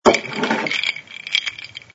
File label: pick up glass; REM-Freelancer; REM-Mod